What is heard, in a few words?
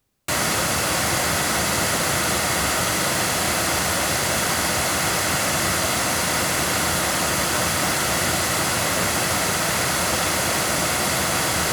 loudspeakers; Pearl TLC90; microphones